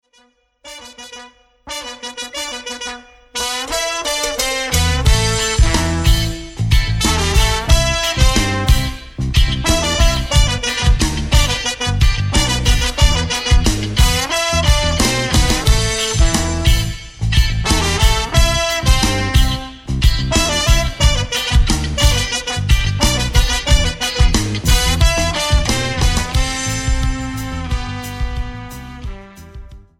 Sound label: Reggae